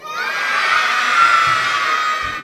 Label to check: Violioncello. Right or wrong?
Wrong